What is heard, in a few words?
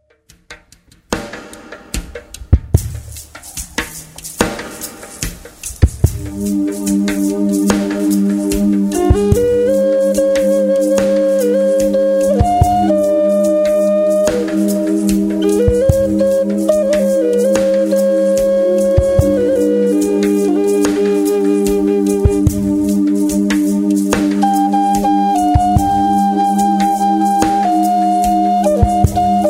song of the flute